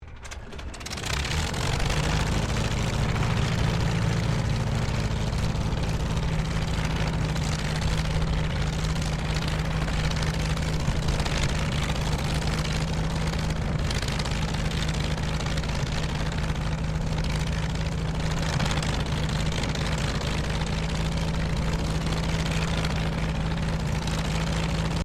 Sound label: Aircraft CT4A Startup Idle, RAAF CT4 training aircraft; Airplanes (Sound Effects:Vehicles)